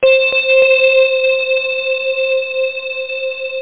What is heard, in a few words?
bells, synths; Zoom - Release 2; Active Software